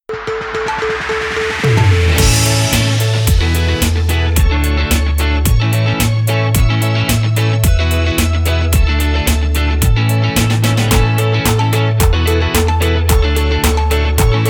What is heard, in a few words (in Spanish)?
Jingle Pista